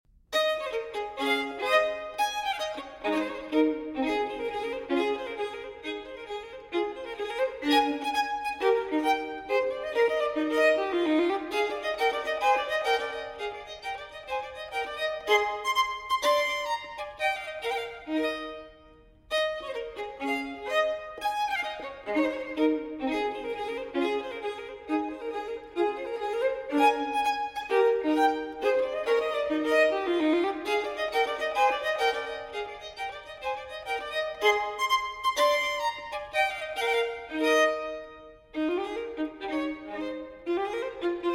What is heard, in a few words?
Solo Violin in A Major Allegro; No. 5; TWV 4018; Telemann Fantasia